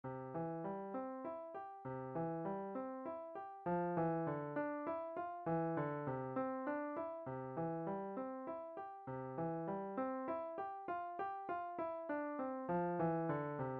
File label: Legato hands separately, piano